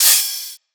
Open Hi Hat, Dubstep Drum Shots

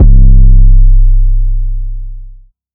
808 Heavyweight (C); Cymatics; Orchid Sample Pack; 808s & Basses

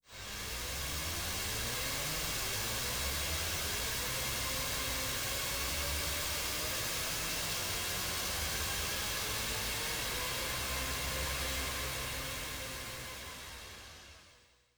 teleporter, Cartoon Film Effects

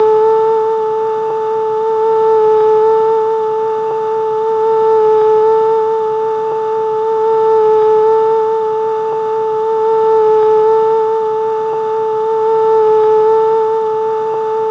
Yamaha VSS One Shot - A, Synth One Shots; Cymatics; Light Free Sample Pack; Bonus Samples

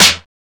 Snare, techno drum shot

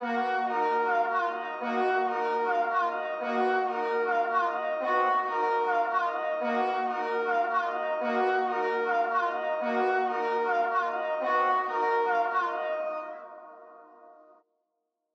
150 BPM D Min Low Strings; Loop Stems; Cymatics; Rowdy

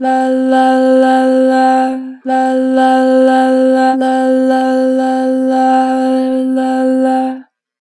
Adlib, Harmor Vocals; Adlib 03; 4 bar flat; Pitch Flat

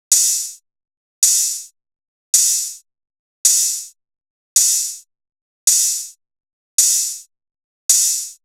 Drum Loop, 108 BPM Open Hat, Experimental; Drum Loops; Loop Stems; Cleansed

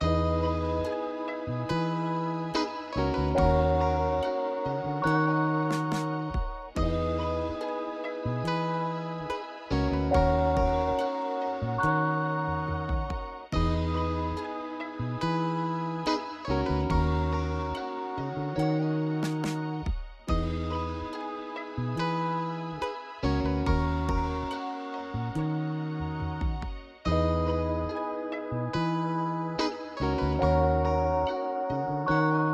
71 BPM G# Min, Vintage; Melodies; Dreaming

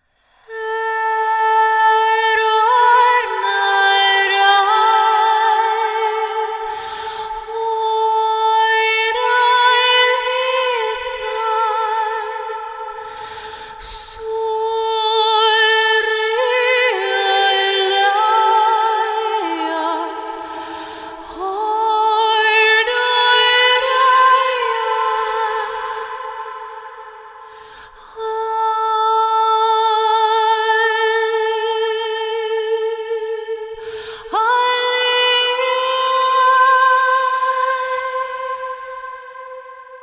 Top Line Vocal - Am 70Bpm (WET); Top Line Vocal 3; Construction Kits; VOCALS; Ghosthack; SkyRealm; Kit 01 - SkyRealm

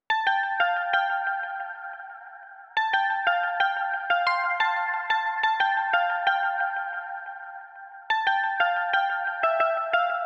90 BPM D Min Juno-60 Bells; Synth; Loops; Loop Stems; ARP+JU60+P5; Cymatics; Fantasy Synth Sample Pack; Good Life

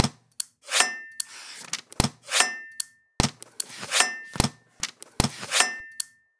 Percussion Loop, Percussion Foley, 150bpm; Dubstep; Percussion Foley Loops; Ghosthack; Dubstep Sound Arsenal

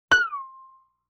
Violin Pluck Slide; Vintage Cartoon; Musical Elements

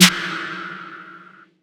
Snare, Dubstep Snares; 808 Snares; Ghosthack

